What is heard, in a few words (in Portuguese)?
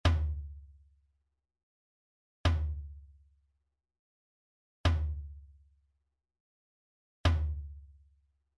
Toque - Surdo Mor; fanfarra